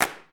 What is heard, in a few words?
Claps